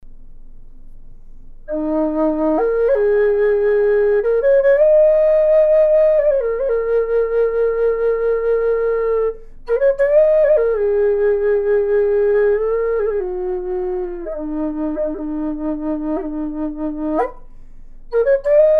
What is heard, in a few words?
Low Eb Bur Oak, flutes